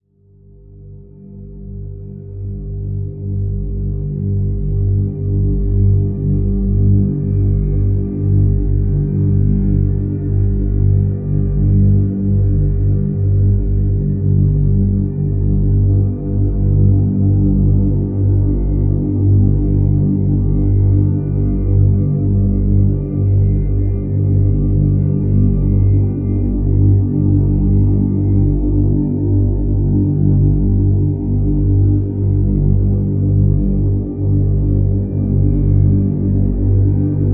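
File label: Drone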